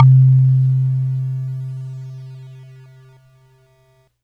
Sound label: Yamaha PSS-140 Funky Marimba C3